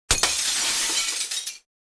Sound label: break glass